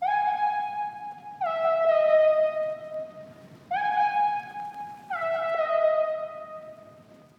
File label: Melody Loop - 130 BPM G# Min Lead; Melodies; Loop Stems; Cymatics; Oracle Sample Pack; Oracle Various Melody Loop 9